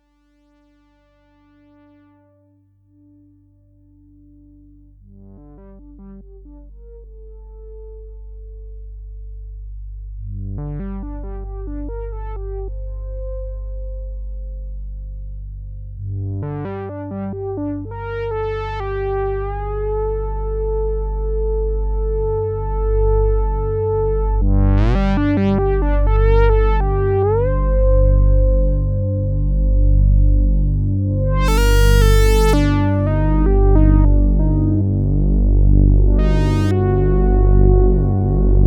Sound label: Dominion 1 Soft Solo, synthesizer